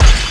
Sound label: menu select; Tony Hawk's Project 8; XBOX